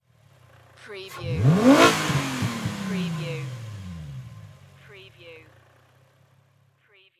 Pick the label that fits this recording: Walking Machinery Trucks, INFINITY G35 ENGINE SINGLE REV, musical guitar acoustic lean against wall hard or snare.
INFINITY G35 ENGINE SINGLE REV